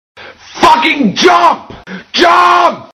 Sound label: jump; svencoop; FZSaySound